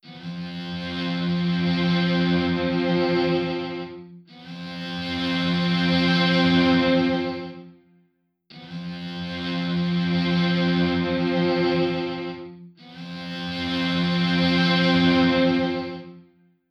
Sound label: Dist Cello; FMOD Example